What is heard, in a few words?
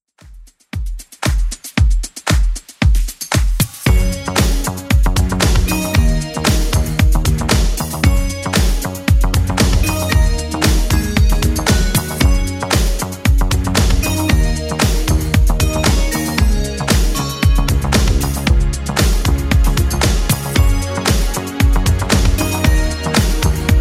80s Club 115bpm; 80s Club Edit